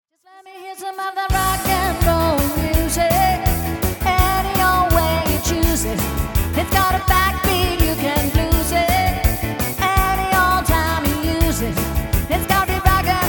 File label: Rock n roll music